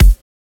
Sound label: Kick Shot